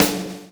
PULL, Backbeat; Spectrasonics - Backbeat

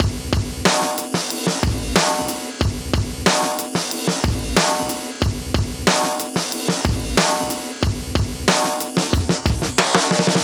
92 Stretcher, Live Breaks

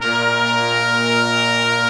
BIG BRASS; OMI - Universe of Sounds

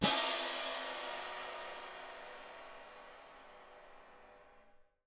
CYM P, Percussion; Miroslav Vitous - Percussion & Harp

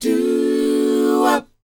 DOWOP E 4; ILIO; Vocal Planet

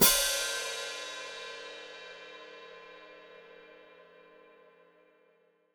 Hat Closed Open, Hi-Hat; Deeply Sampled Drums; Cymbals Stereo

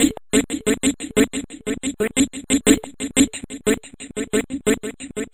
Mouth Music, Vocal; Sonic Foundry (Sony Creative Software); Myths of Technology

